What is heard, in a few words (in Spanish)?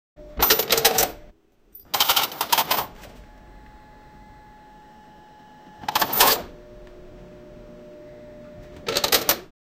sonido de billetes cayend